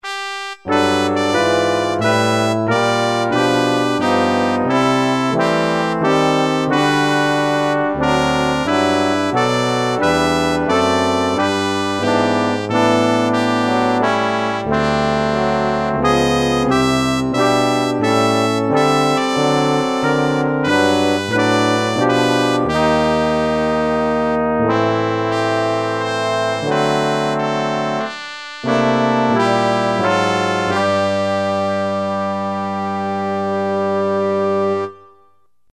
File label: variation brass